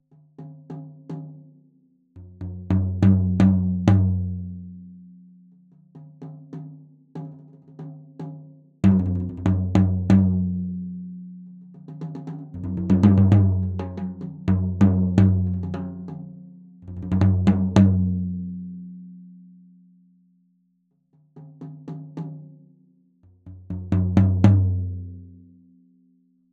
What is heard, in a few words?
md 421 kompakt jazz drums floor; mikrofonu test